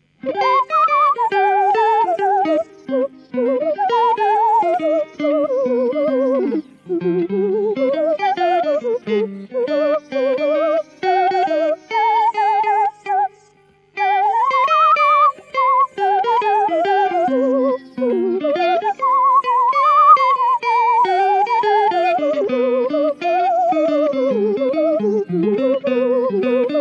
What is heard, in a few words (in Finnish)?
kitarasyna; guitarsynth